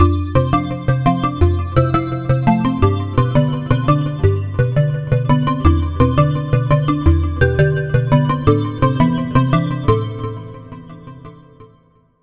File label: Key